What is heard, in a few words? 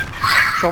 crazy scream